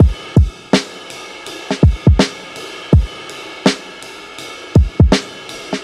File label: ringing out for days, funky drum loop; Drum loops